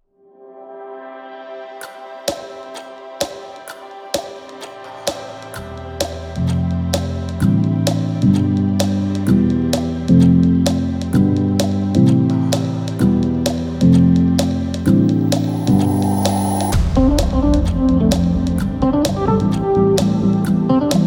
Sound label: with reverb; ducky